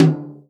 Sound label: tom, Real Drum Kit, jungle kit